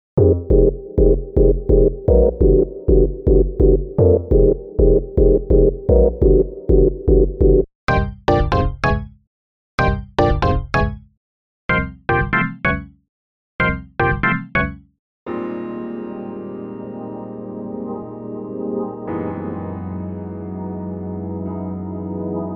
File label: chords